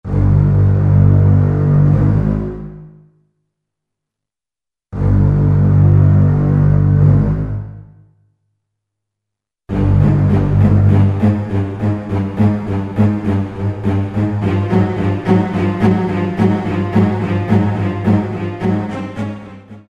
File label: Ominous, Roland SR-JV80-02 Orchestral